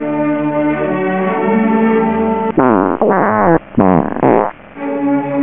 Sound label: fart